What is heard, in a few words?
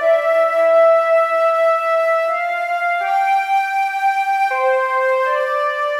Silk flute, ambient